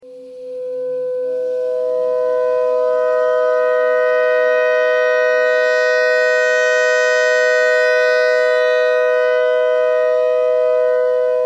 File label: clarinet notes e thread noise